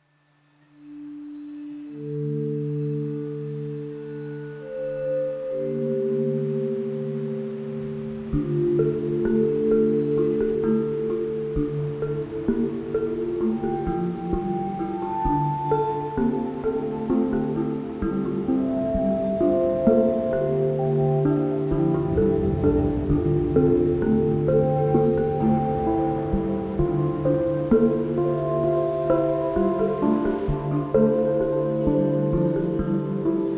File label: java mallets